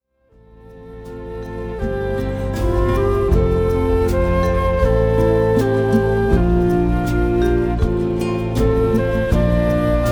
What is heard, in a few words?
Flute